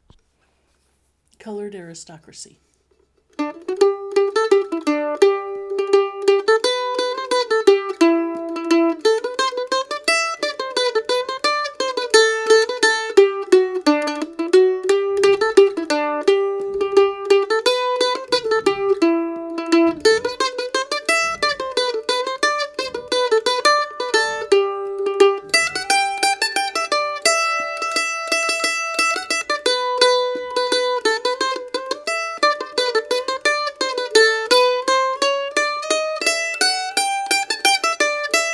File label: mando; Colored Aristocracy